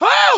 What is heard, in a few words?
hurt heavy; cj